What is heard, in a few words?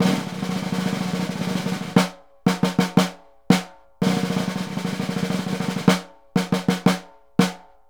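snare, Synclavier Percussion; Synclavier Percussion 2; World & Orchestral; ILIO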